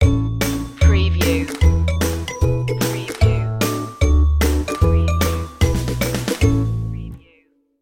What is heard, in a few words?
yip jump stinger